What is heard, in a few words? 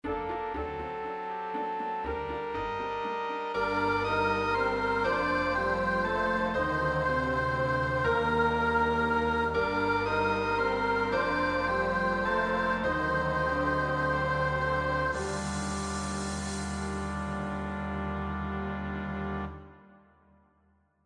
alto